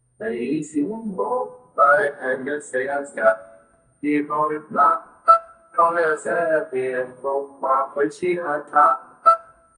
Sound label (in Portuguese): um som de avantura conhec, adventure